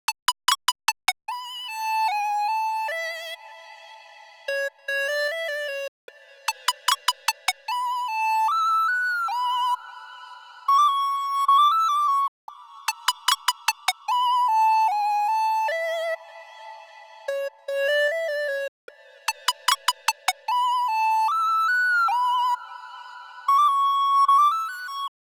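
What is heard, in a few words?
150 BPM F# Minor Lead, Future Bass; Melodies; Loop Stems; Cymatics; GHOST (Beta Pack); Believer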